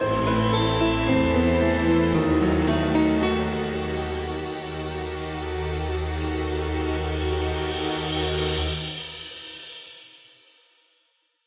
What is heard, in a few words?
Arpeggio; tintin reporter cigars of the pharaoh